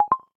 Cable Connect

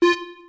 Key Tone; Samsung; SPH-W4200

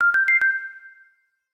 Flow tone, notifications; Fujitsu Arrows-F-04H

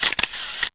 camera shutter; LG; U970 Shine

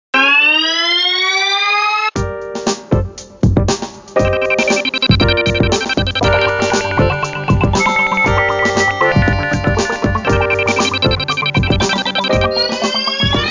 Storm

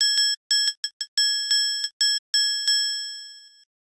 Triangle, ringtones; Fujitsu F-02J

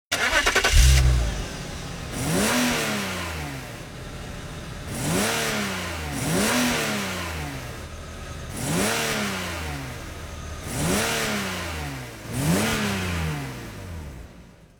Car background music; Nokia; Audio themes

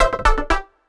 Drill alert; Sony Ericsson; PlusFourSix; SE Sound Project; Paul Bergman

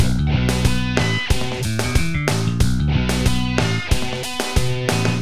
ROCK TONE, ringtones; Google; NewWave Labs

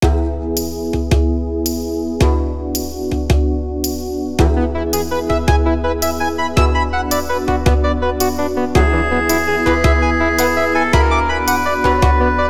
Alarm, phonetones; Sony Ericsson; PlusFourSix; Lars Edvall; Shores Alarm

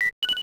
Beep Start; SKY; IM-U450L; System Sounds; Camera; Video Rec. Sound

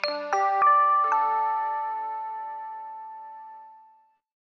SMS Soft, message notify; SKY IM-A730S-VEGA-S

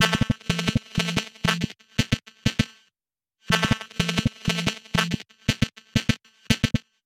coded, ringtones; Nothing Phone (1)

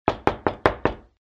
knock knock; Huawei U7510

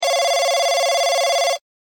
Digital Ring, ringtones

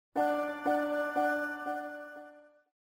Power Off; KF900 Prada II

